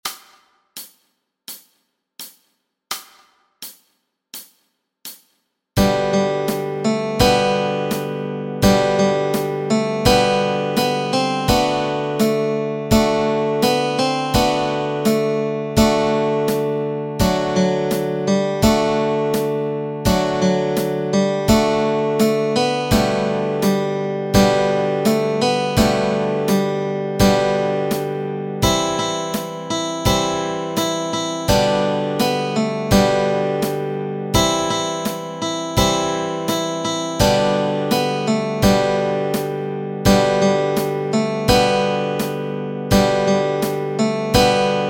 This is the Day melody and chords

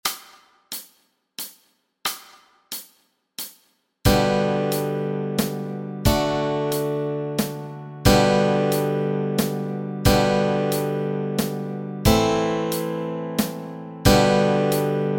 Chords Track, Easy Guitar

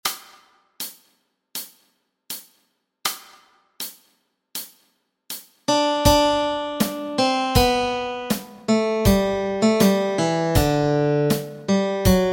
melody

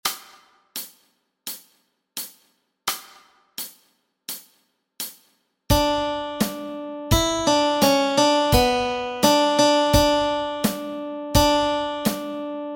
Melody Track

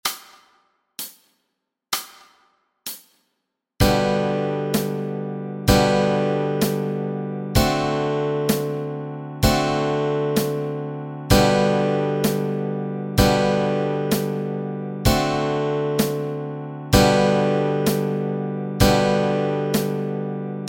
Chords Track, Easy Guitar and Ukulele

